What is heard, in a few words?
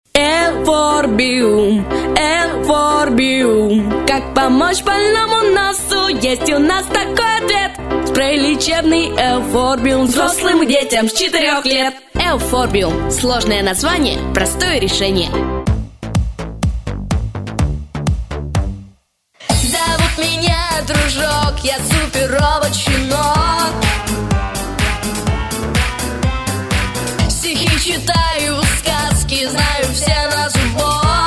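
vocal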